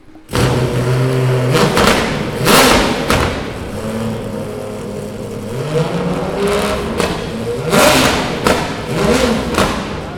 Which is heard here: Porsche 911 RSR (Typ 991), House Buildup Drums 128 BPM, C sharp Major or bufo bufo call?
Porsche 911 RSR (Typ 991)